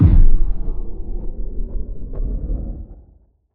Impact; impact samples

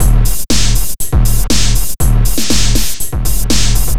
Noise Beat; Drums & Drones

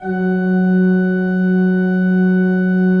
F2, Cathedral Organ; ILIO; Propeller Islands Cathedral Organ

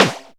Flange Snares

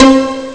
pluck; Amiga Soundtracker Sample Packs

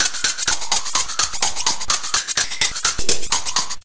126 PERC, PERC LOOP, Fresh Disco House

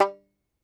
Tenor Banjo, Plucked Strings

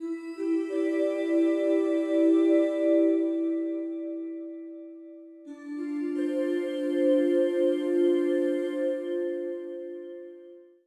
SYNTH LEAD; Keyboards; Leads

